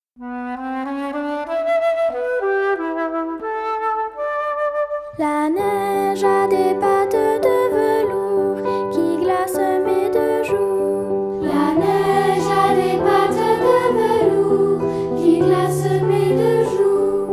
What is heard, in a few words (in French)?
Neige en pattes de velours Version vocale